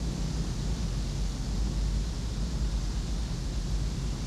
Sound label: Ambience Loop, outdoor